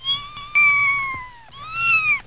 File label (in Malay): anak kuching